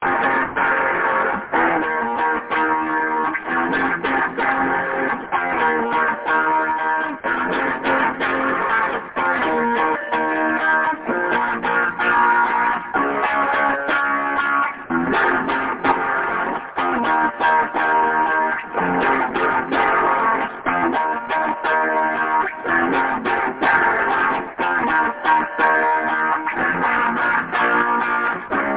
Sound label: rockin implied double time chords